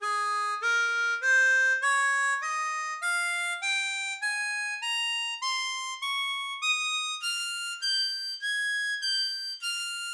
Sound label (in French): La B Majeur, Gammes, harmonica